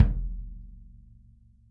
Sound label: kick; Sonic Reality; Interactive Drum Kits; 90s sample cds